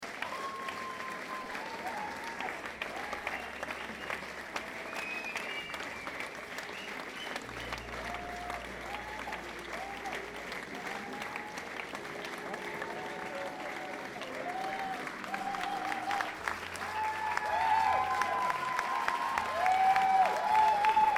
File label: Encore applause; Pre-encore II